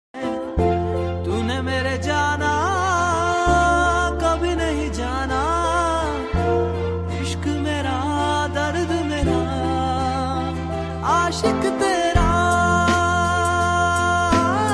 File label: Sad Hindi Song Ringtone